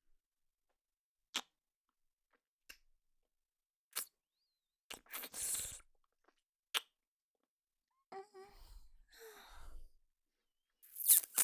ちゅぱ音

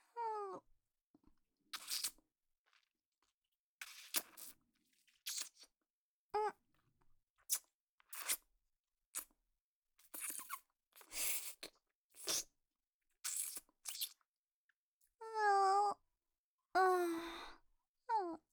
咥えて舐める音

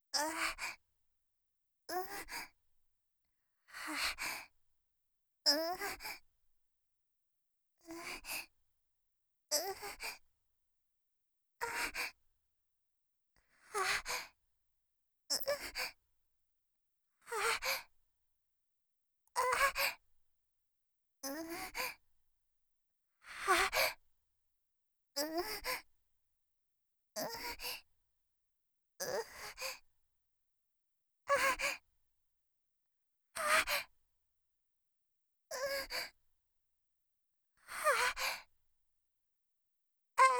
喘ぎ声 極小

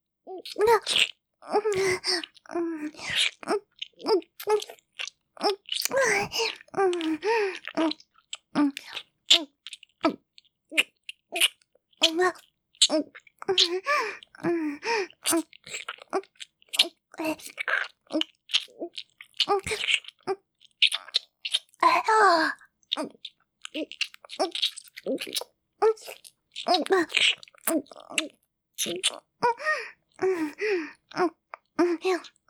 フェラ イマラチオ

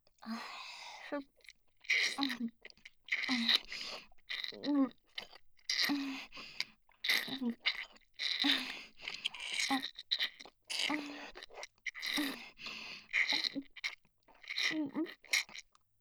フェラ音(激しめ)

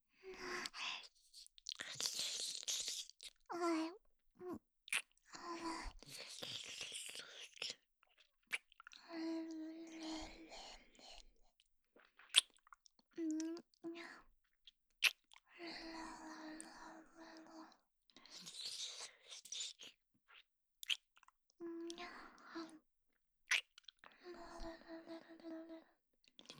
フェラ音

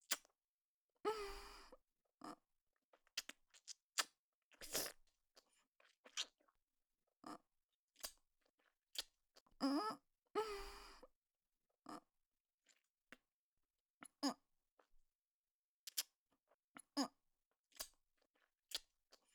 咥えて吸う音 激しめ

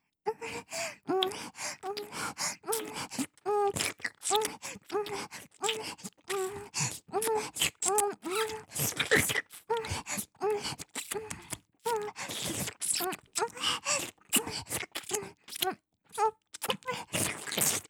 フェラ イマラチオ

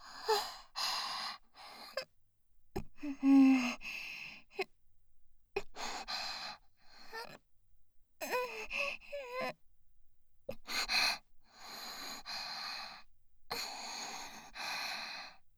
喘ぎ声(極小)

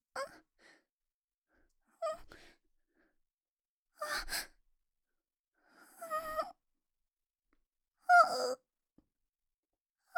喘ぎ声 小

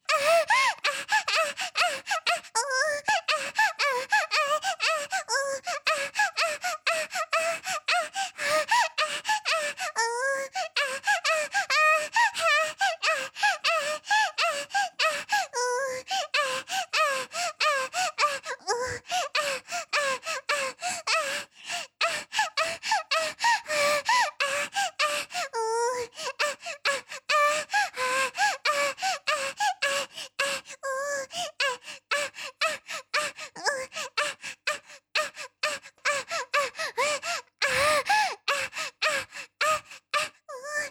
喘ぎ声 大

